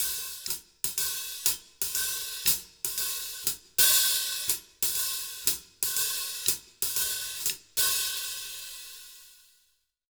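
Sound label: 120 SHUFFLE, Jazz Latin Drumloops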